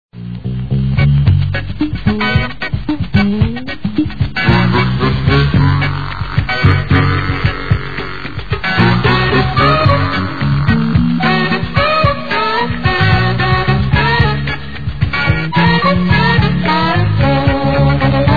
english sitcom theme music ringtone

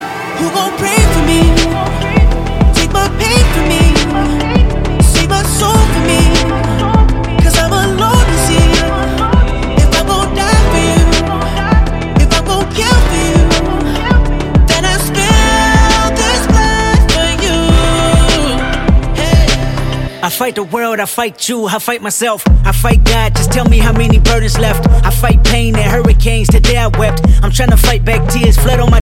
motivational song tone ringtone

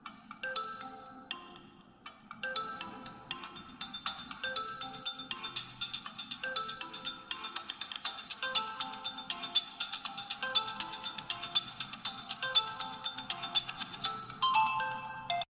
oppo reno 6 lite ringtone

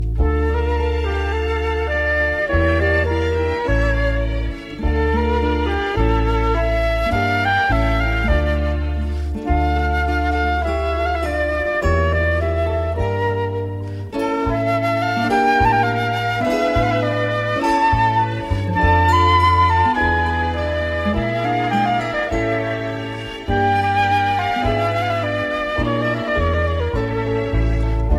flute lover ringtone

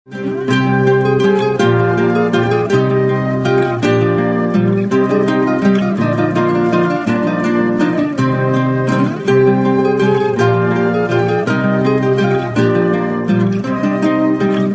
neo guitar ringtone

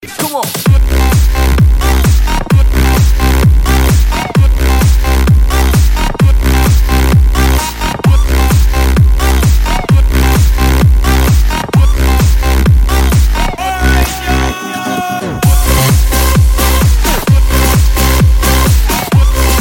club mix ringtone

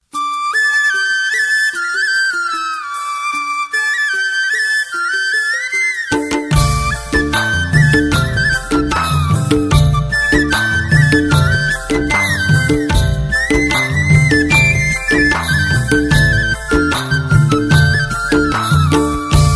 classic india ringtone

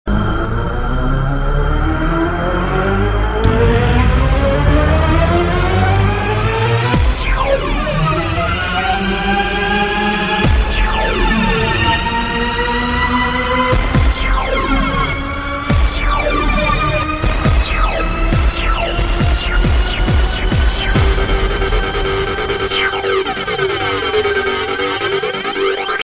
beat

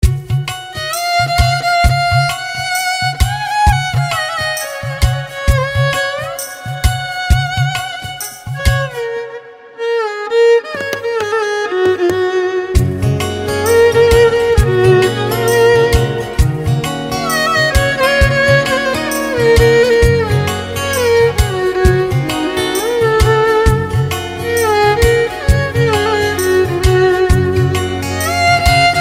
violin ringtone

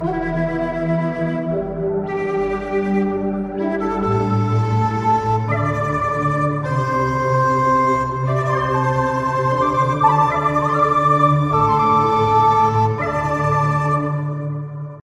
non stress tone

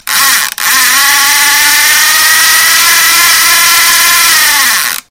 fishing reel clicker sms tone

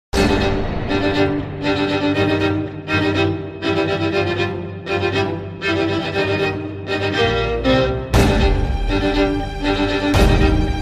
theme violin cover ringtone; avengers; english ringtones

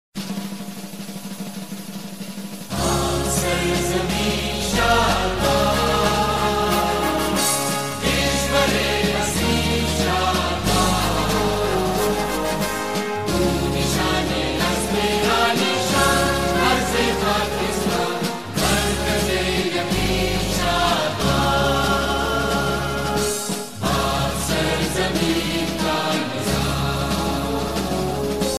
anthem of pakistan ringtone; islamic ringtones

